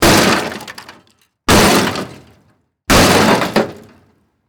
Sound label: Objects Smash; MinorHallutinationsSounds; SFX Producer